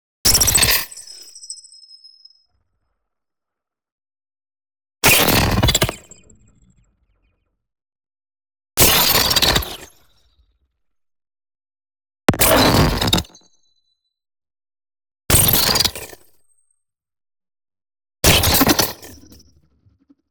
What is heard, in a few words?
NEON ZAP Discharge; boom library